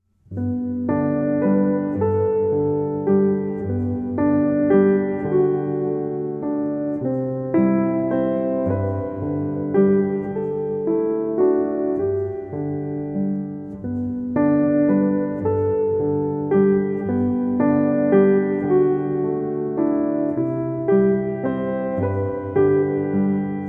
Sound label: Rock a Bye Baby, Relaxing Piano Lullabies